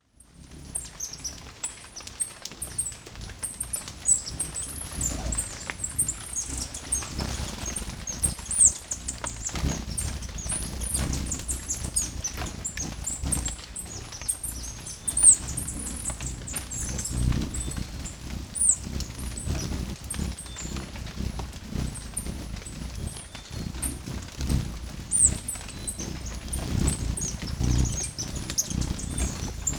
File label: Buff tailed coronet; پرنده تاجک دمنخودی